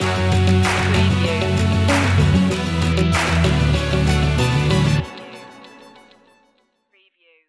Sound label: full throttle stinger